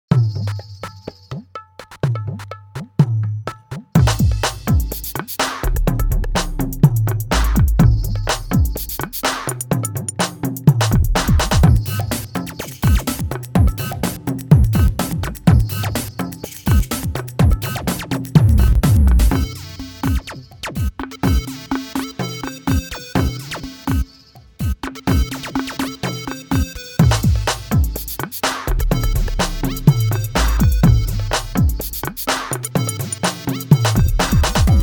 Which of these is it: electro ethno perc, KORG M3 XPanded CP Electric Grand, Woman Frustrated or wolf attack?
electro ethno perc